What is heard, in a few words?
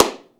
CLAP, HAND CLAP